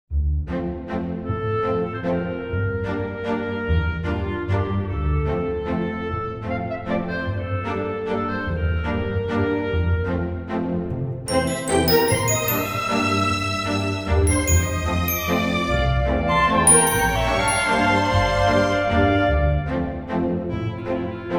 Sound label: Waltz; Waltz in the Vaults